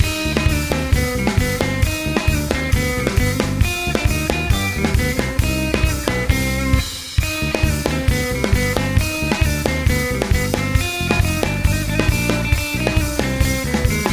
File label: Klezmer